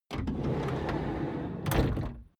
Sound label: car slide door open